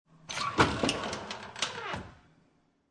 Oven Door Open, Household, Oven, Door Open, Some Squeaks; Kitchen